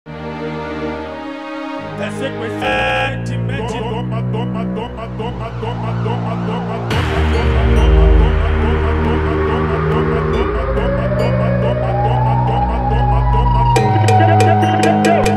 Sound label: SIREN HEAD FUNK